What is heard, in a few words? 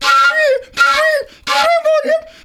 FLU + VOC, FLUTE + VOCAL